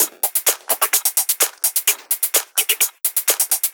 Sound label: Drum Loop 128BPM Perc; VEC5 Drumloops; VEC5 Multi Loops; Loop Elements